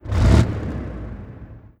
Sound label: select tank; Hearts of Iron IV Death or Dishonor